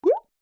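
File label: button rollover; toystory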